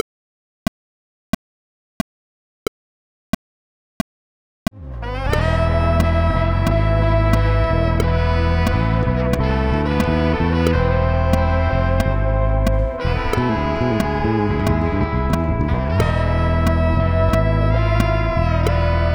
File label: DRUM CHALLENGE 90BPM CLICK